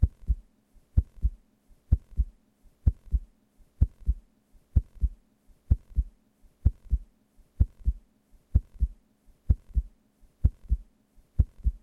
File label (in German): herzschlag puls normal; salami sound; herzwissen grundschule materialien